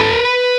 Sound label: solo bend full